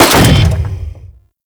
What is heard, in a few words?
Saw Blade Launcher Shot; Warframe